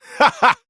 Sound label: Power Cast; Warframe; Entrati Lab Quest; 2023 TennoCon; Arthur